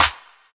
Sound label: clap one shot c key